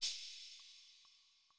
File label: ride, lofi hiphop; Legendary lofi hiphop